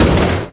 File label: Sleigh bells; Ozar Midrashim 1.1